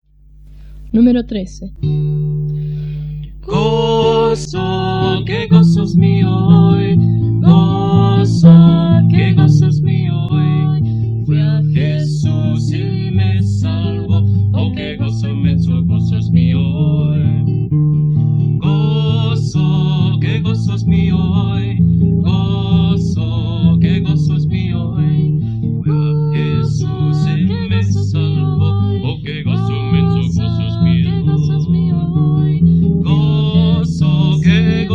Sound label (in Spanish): Coro, himnario niños